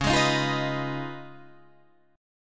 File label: Db 9th, Guitar, Open D